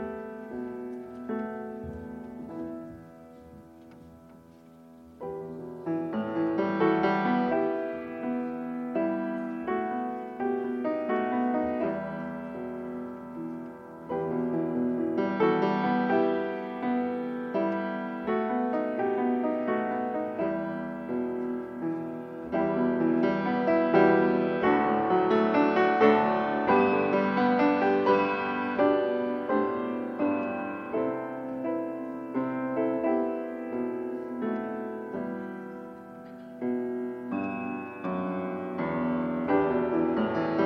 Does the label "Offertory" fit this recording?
Yes